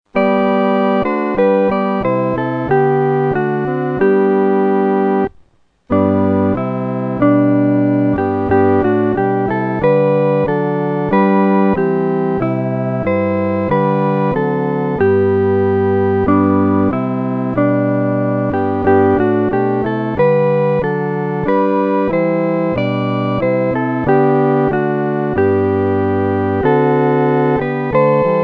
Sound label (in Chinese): 合奏 四声部